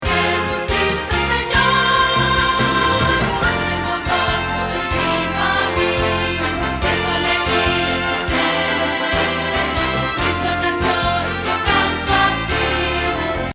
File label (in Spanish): Contralto